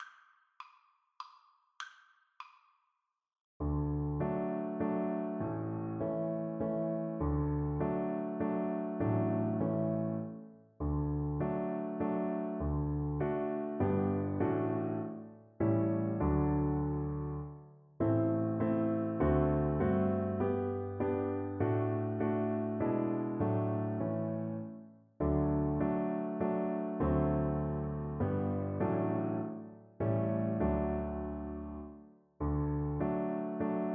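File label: VLC karaoke, Cello; afton water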